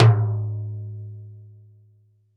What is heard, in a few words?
Timbal Lo Long, Roland BK-5; Percussion